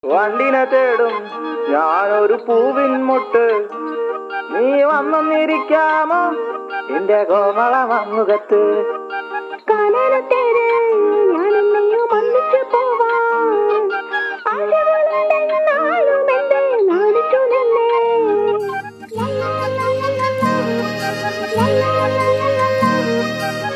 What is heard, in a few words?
malayalam song